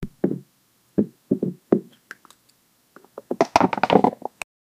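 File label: sound effect of people falling, bed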